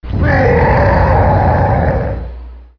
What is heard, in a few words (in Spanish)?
rugido koopa; ze mario tower lg